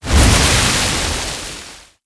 Water Shot; blue archive

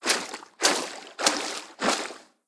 Water Foot Step; blue archive

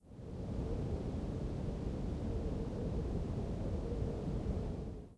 Snow; blue archive

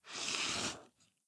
Eat Noodle; blue archive

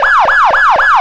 yelp, sirens; federal sig 80k

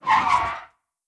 Vehicle Stop; blue archive

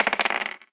Dice; blue archive

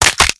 Break; blue archive

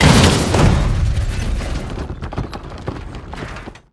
Heavy Tank Death; blue archive

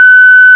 emv wind, whelen gamma sirens

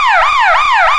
yelp, sirens; federal signal eq2b

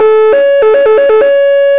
hilo police, sirens, austria; code3 austria

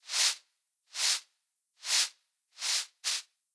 Broom Sweep; blue archive

